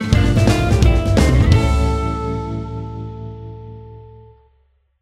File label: Music, Jazz Hands; Emote; Athena